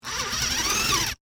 Electric Drill, Industry, Electric, Drill, Wood, Bit, Into, Wood; Drills